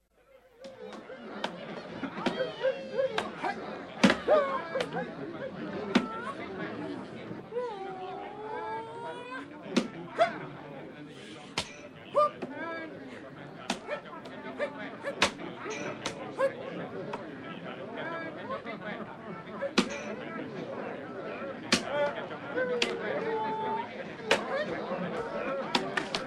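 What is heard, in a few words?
bone-breaking technique or martial arts battle with spectators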